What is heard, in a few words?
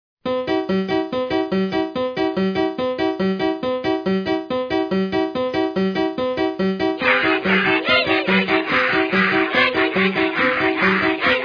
Sonata For Piano And Moron In C Major